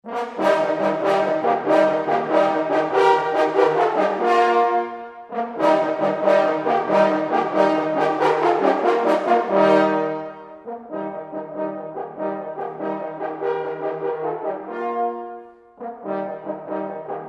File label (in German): Jagdfanfaren